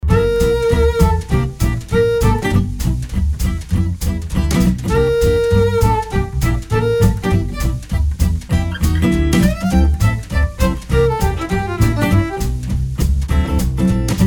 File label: Fiddlesticks